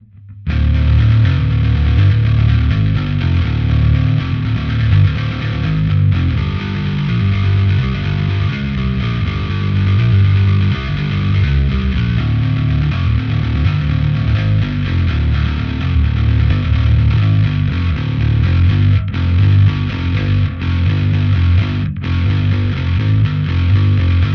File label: Metal Cabsim